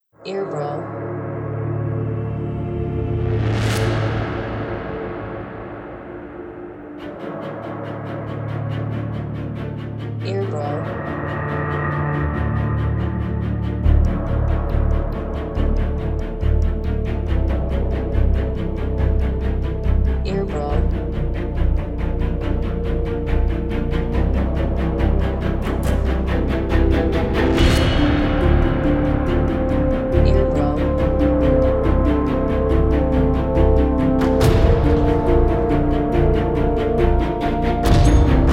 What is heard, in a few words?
Machine Trailer